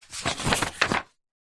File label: household book artists sketch pad page turn; zapsplat